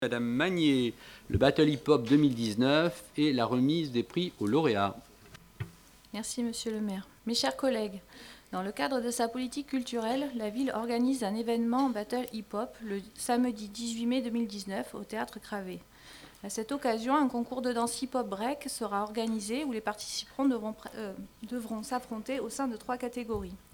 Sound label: Battle Hip Hop